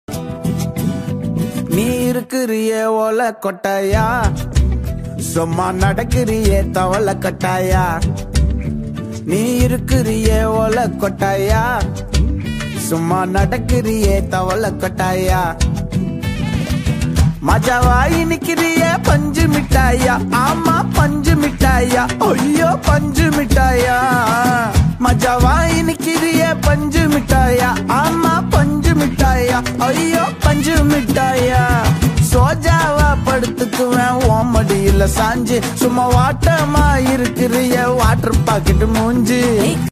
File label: bgm